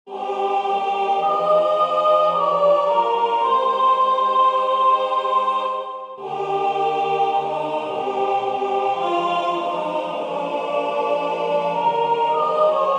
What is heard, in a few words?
Meekness sop